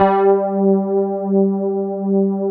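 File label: Fender Rhodes G4; Keyboards Old School; USB Soundscan vol.09; AKAI; FEND.ROAD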